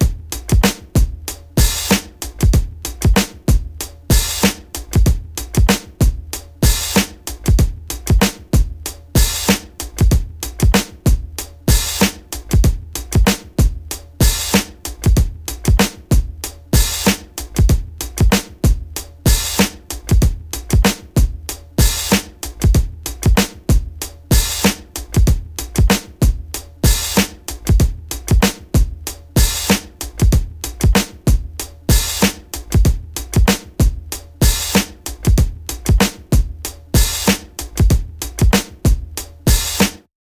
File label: 95 Nicetight, breaks; Lockdown Breaks